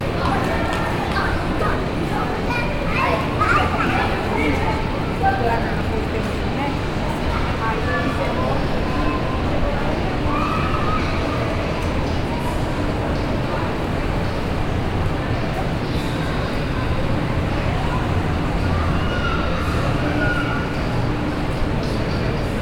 Shopping, Brasilien; Fieldrecordings

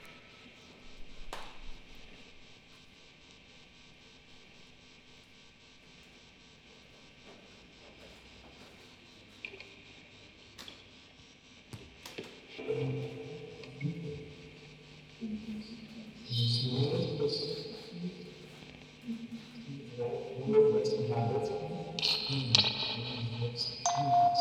Aufnahme (Rode NT4), Spieluhren-Quartett mit Tonband, Vocoder und Fieldrecording

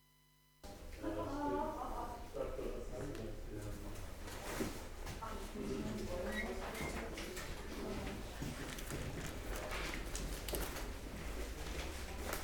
buergeramt lichtenberg; moellendorfstr. 5; Fieldrecordings; spatzen

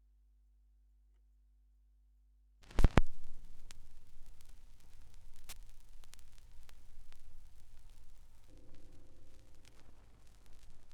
schallplattengeraeusche

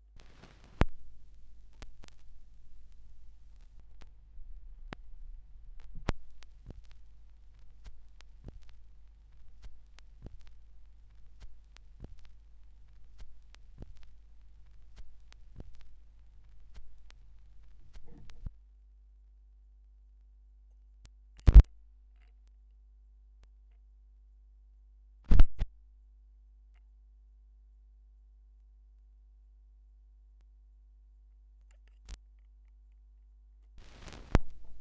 schallplattengeraeusche

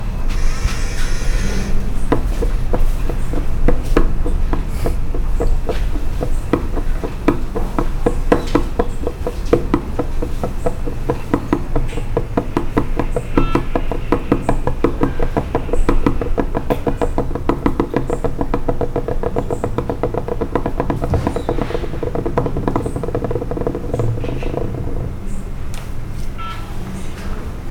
Glas in Der Spuele; Brasil; Fieldrecordings

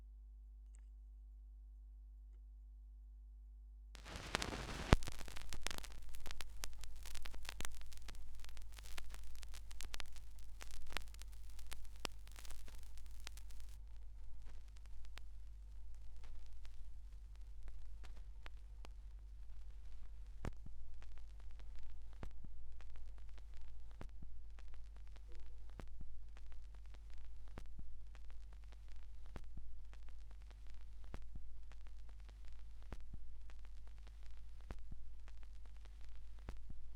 schallplattengeraeusche

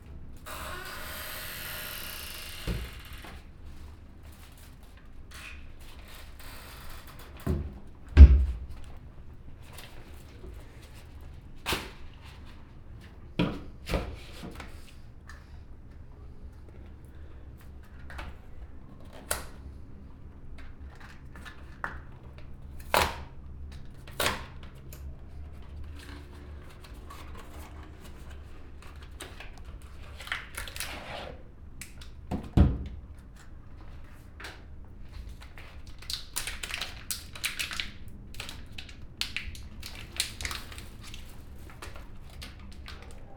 Experimente In Der Kueche, Fieldrecordings

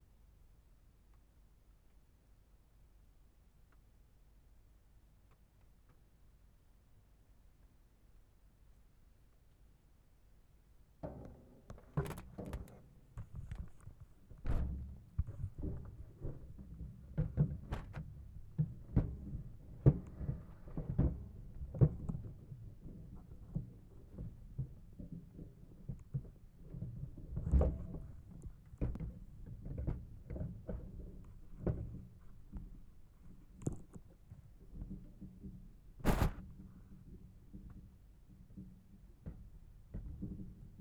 Schnee auf Alufolie; Das Kleine Regen Rhythmus Orchester

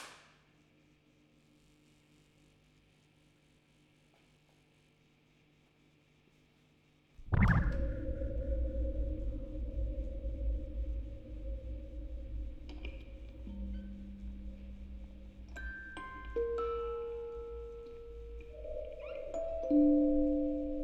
Aufnahme Rode NT4 Tape out Vocoder, Spieluhren-Quartett; Tonband; Fieldrecording

